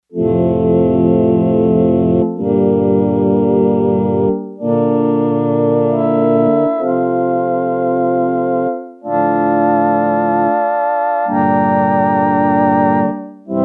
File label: Choir, Yamaha TX802; Factory Patches; Voices